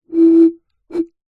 foley blowing air over top of plastic bottle short; zapsplat